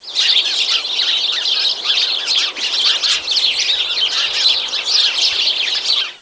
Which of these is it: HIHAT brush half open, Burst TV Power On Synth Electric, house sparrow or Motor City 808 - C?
house sparrow